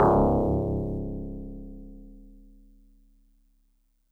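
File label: Tubular Bells DX100 C sharp 0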